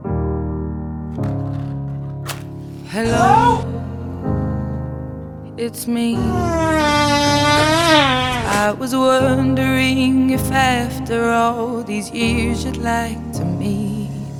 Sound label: fart song